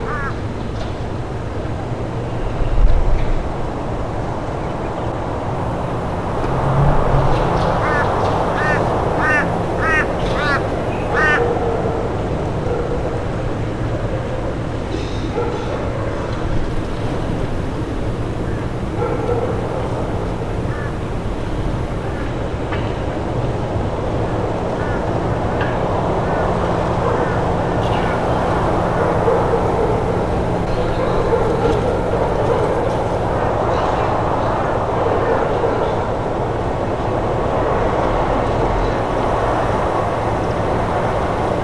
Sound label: city ambient scape